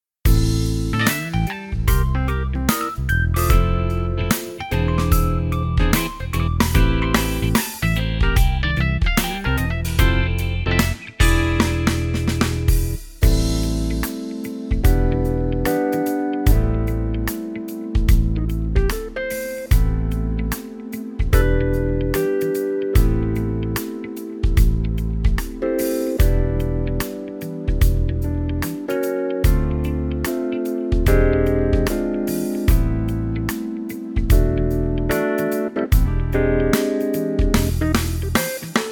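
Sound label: fully orchestrated playback cool shuffle A minor; koolshuffle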